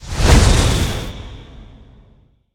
swing; smite; god thanatos

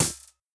Stick Click; smite; raijin

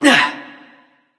grunt attack; cernunnos

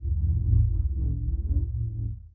magic; smite; ahpuch skin10

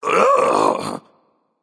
ugh, voicepack; smite; jorm

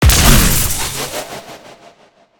activate; smite; god raijin

